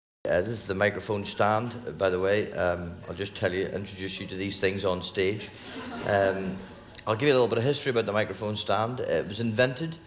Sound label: Microphone; Jimeoin